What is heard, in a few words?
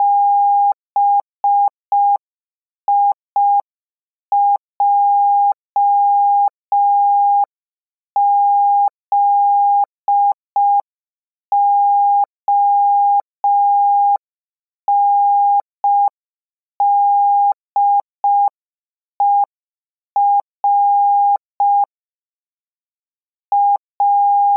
morse audio